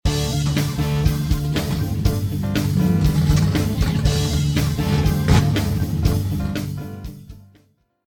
intro, American Poker; American Poker V; wazdan

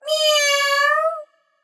bigwin louder; Colin The Cat; wazdan; ultrafast

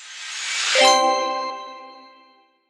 love bonus enter; Beauty Fruity; wazdan

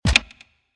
card lock off; American Poker V; wazdan

